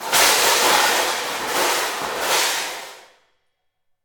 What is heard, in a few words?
Crashes Mix